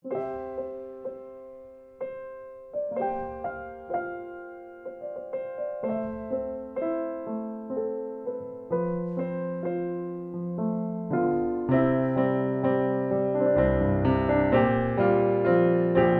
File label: Piano in Blue